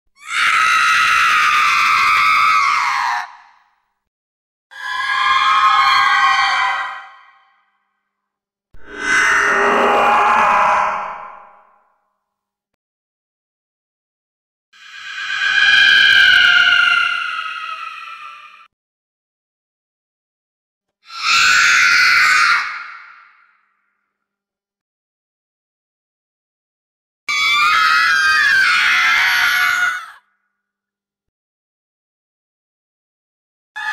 suoni horror molto paurosi effetti sonori

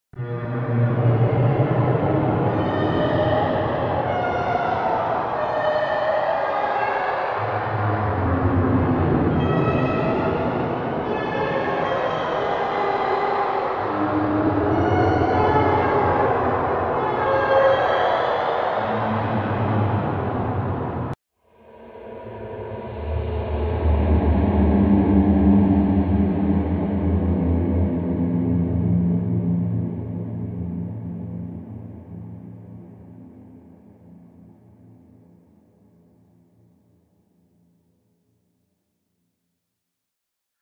suoni horror suoni spaventosi e inquietanti scary sound

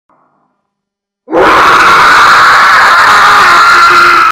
urlo spaventoso scream scary

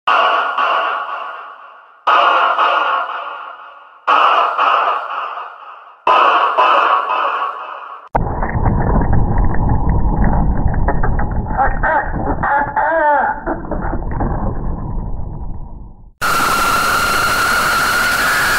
suoni horror effetti sonori bhoot sound creepy sound effetti sonori horror

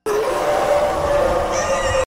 urlo spaventoso effetto sonoro sounds scary

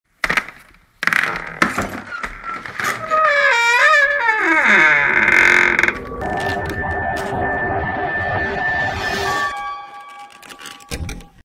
suoni spaventosi di una porta che si apre, horror; sound horror shorts

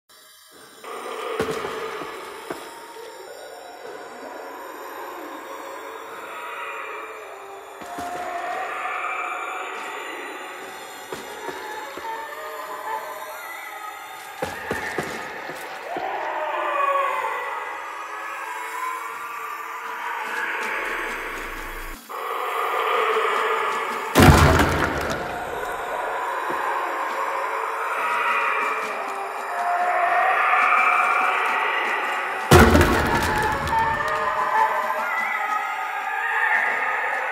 sussurri spaventosi e inquietanti suoni horror per halloween; halloween 2023; sound effect horror

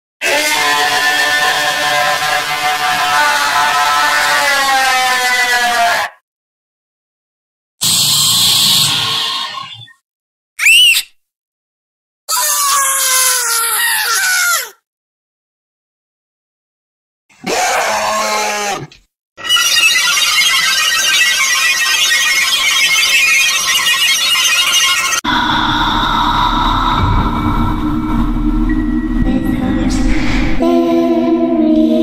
suoni horror effetti sonori horror per halloween sound halloween scream

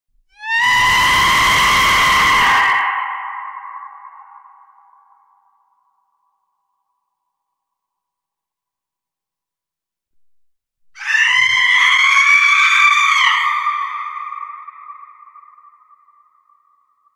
suono delle urla effetto sonoro urla sound scream scary; sound effect; scp

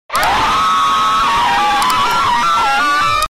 suono horror jumpscare in loop

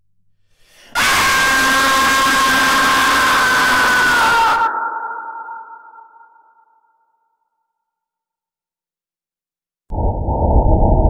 suoni spaventosi effetti sonori horror sound horror scream scary

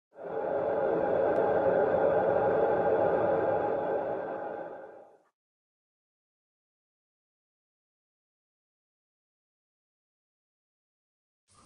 sussurri inquietanti effetti sonori horror; original sound effect spaventosi

